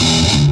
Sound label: CHUGGER, Distortion Gtr; PlatinumPhattCD2; E-MU Producer Series Vol. 8 – Platinum Phatt